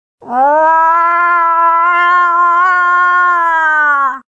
Cow In Heat

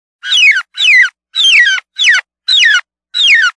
Gobbler Distress

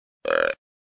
Contact Grunt

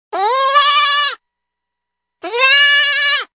Wailing Kid Goat

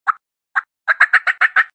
Fly Down Cackle

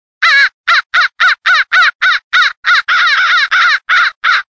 Crow Revielle

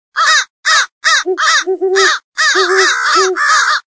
Crow and Owl Fight

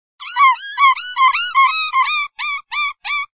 Baby Jacks Distress

